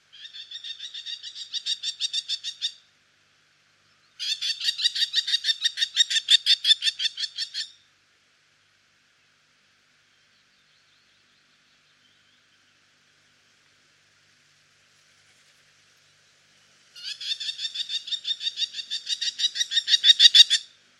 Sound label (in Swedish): Stenfalk